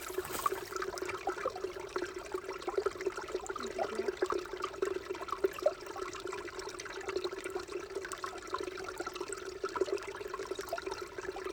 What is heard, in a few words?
stream Gurgling; Fishlake; ambience